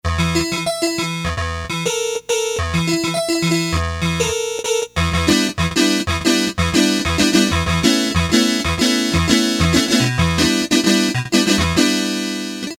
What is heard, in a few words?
Metallic Sound, Casio CT-370; Tones